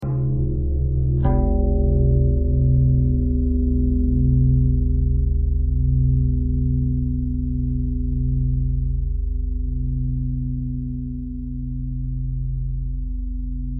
bell bell thread simple, bells

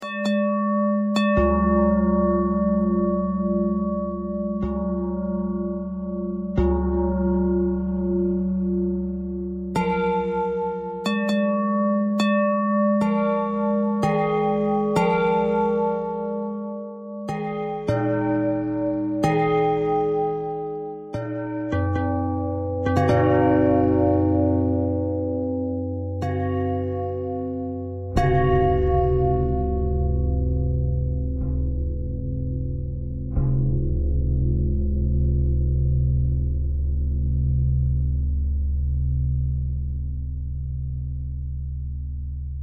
bell thread pentatonic

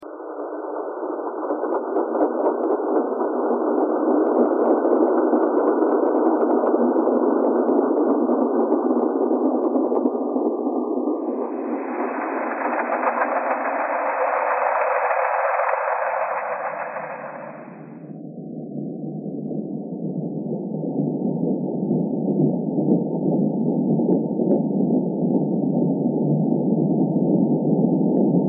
thread noise

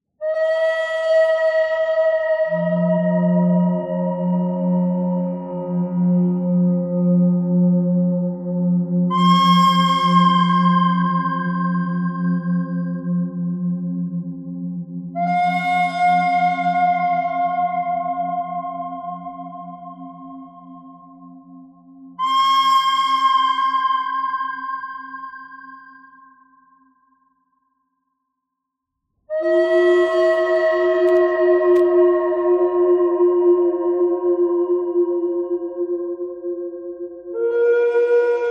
bird thread noise; birds

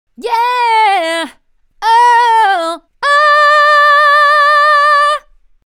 Yeah Oh Instrument Input

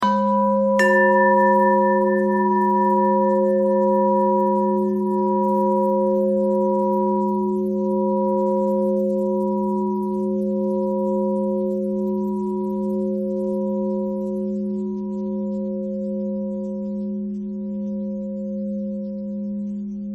bell thread pentatonic

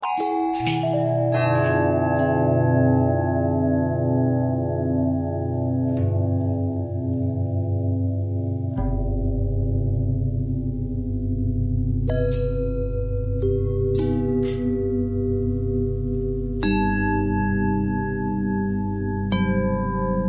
bell long bell thread pentatonic

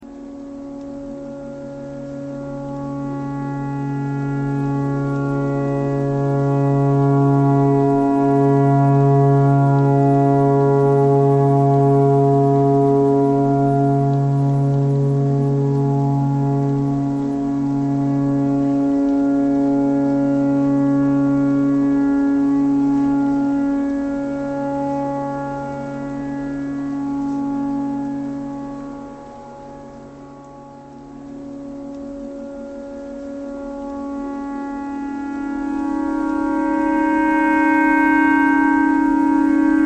clarinet tornado siren thread pentatonic

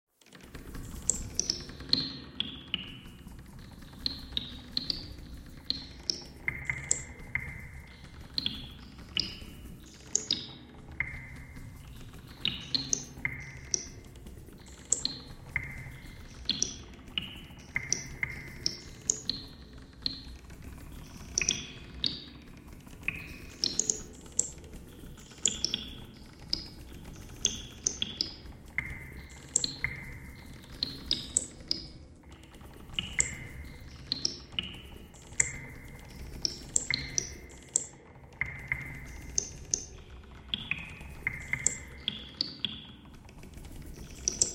bagpipe thread noise; reverb

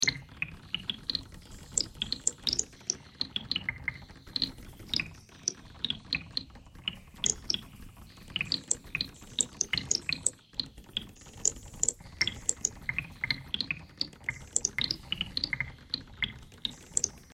bagpipe thread noise; bagpipeclick

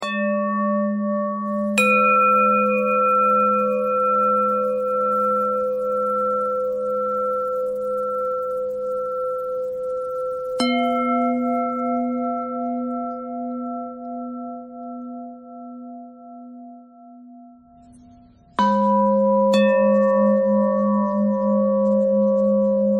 bell threads

